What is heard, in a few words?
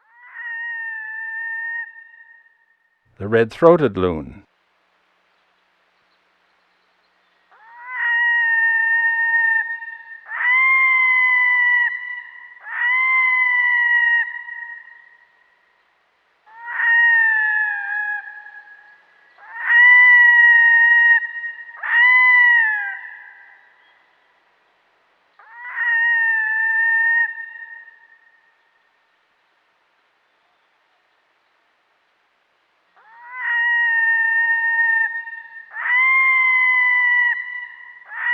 Red Throated Loon; Western North America; Dan Gibson; Wildlife Identification By Sound